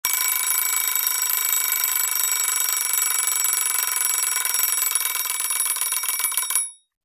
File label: Vintage old alarm clock ringing